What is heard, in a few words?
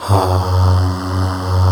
SOUL AAHS